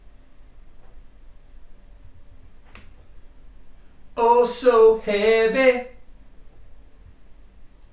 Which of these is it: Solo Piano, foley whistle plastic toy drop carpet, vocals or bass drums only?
vocals